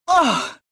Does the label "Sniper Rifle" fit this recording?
No